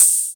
smooth open hat one shot c sharp key, Royality free open hi hat sound tuned to the C# note